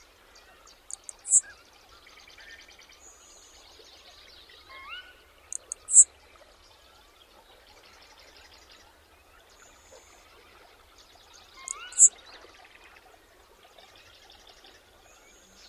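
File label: Le Conte's Sparrow abbreviated songs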